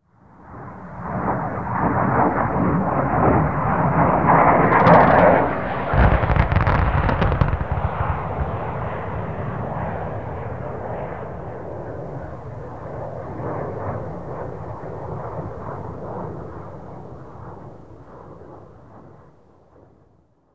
jet flyover stereo; amb elements; modern warfare 2

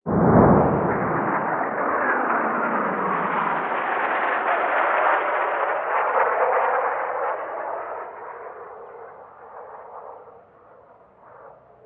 artillery med; modern warfare 2; amb elements

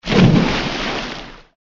splash; modern warfare 2

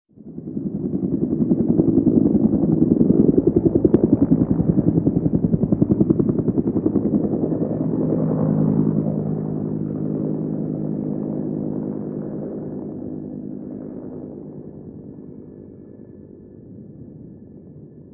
heli flyover int; amb elements; modern warfare 2

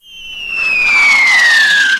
mortar incoming; modern warfare 2; Explosions

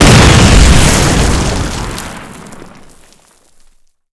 Mortar dirt; modern warfare 2

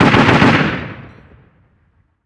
gun 50cal med; amb elements; modern warfare 2

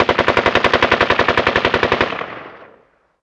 gun ak47 med; modern warfare 2; amb elements

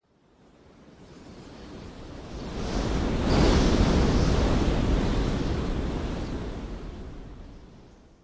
wind buffet; amb elements; modern warfare 2

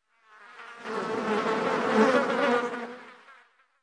insect fly; modern warfare 2; amb elements

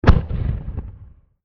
explosion low dist; modern warfare 2; amb elements

